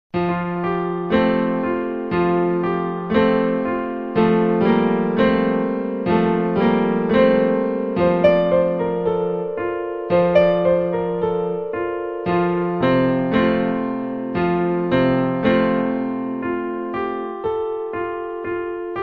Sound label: Virtual Piano Frere Jacques Two tigers